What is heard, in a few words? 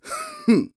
Scoffing; Ghirahim